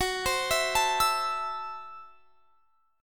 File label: F sharp Minor 7th Flat 5th F sharp; arpeggiated; x,21,22,21,22,24